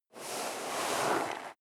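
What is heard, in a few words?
step sneaker sand slide long, footsteps; schritte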